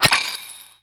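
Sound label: attack coin sword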